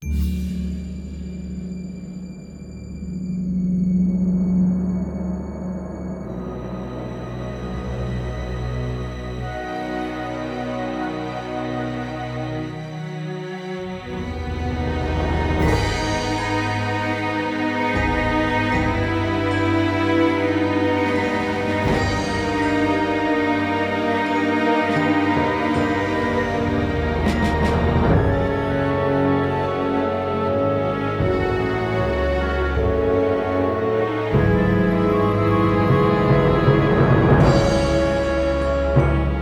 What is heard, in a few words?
Triumph Music; Unreal II The Awakening